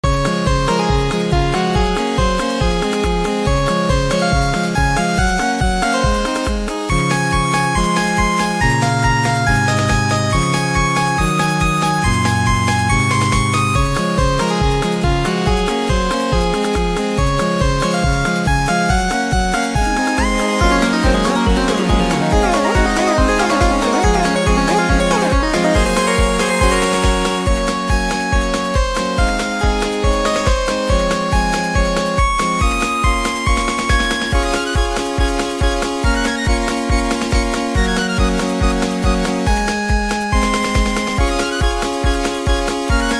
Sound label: Unicorn Horn song; Enter the Gungeon